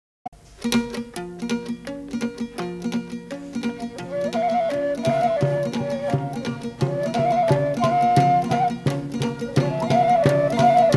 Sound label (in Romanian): Caval si fluier